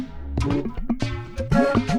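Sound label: Music Base 120; From Dust; ps3; xbox 360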